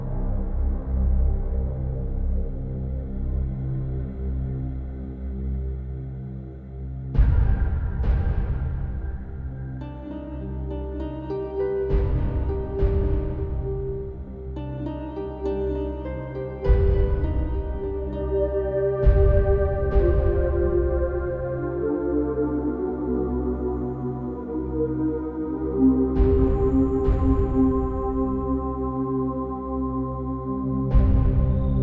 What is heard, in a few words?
Library Music loop; myst